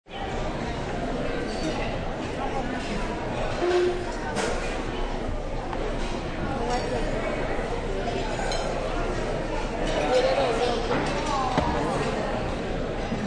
Crowd Large Busy Indoor Restaurant, Serving buffet.; People talking, eating lunch